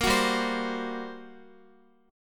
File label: G Minor Major 11th, G, Guitar; GmM11 strummed; x,x,10,11,7,8; JGuitar